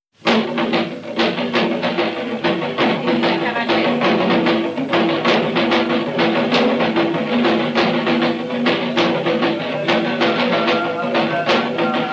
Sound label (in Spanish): Ritmo y danza Las habas verdes de Mucientes